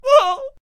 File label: Potionseller Death Vocals